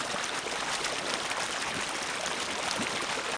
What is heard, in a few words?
water